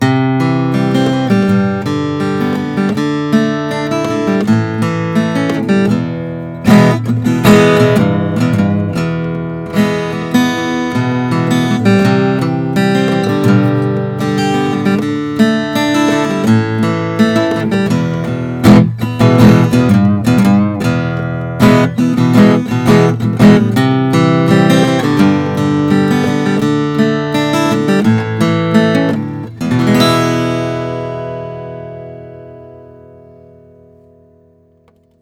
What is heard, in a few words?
collings 02 short scale natural; 1 75 nut